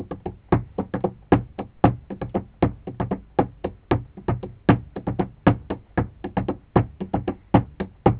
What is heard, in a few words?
percu